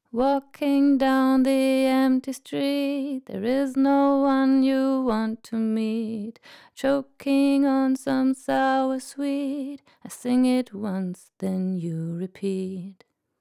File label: Vocal Saturation; Rockruepel Comp.Two V2